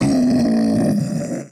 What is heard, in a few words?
Ogre Death